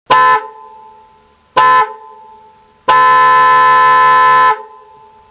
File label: wind tone; bosch